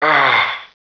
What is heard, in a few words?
sigh; svencoop; decay